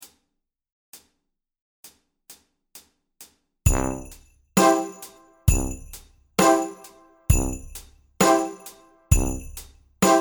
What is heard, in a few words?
Jingle Bells Tempo 66